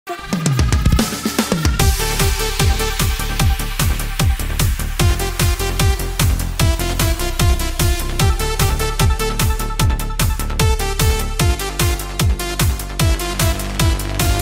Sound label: retrowave synthwave